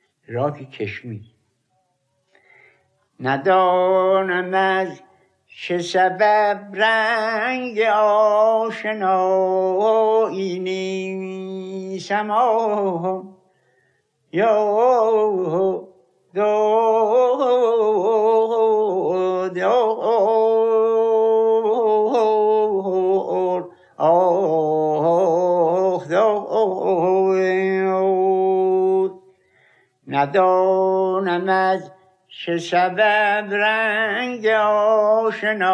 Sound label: rak keshmir, mahour